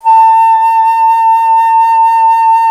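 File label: flute A; Miroslav Vitous - Solo Instruments VOL-2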